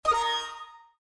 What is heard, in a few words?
Windows Error